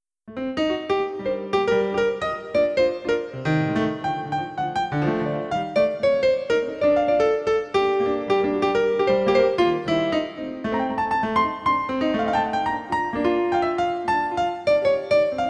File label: Jazzy Interlude